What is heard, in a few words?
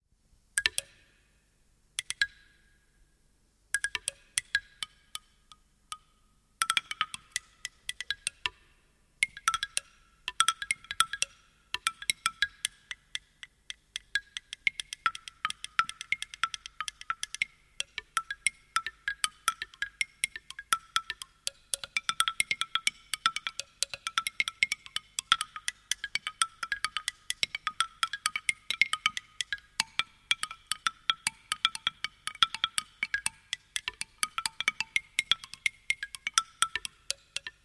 Clave Solo